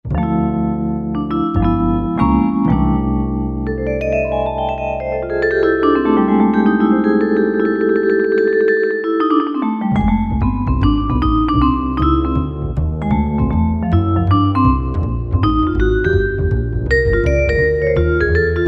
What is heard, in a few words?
Bass & Vibes, Korg 05RW